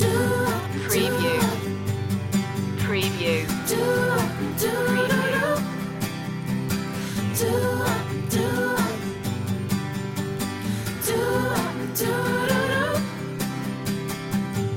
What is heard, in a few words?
break out loop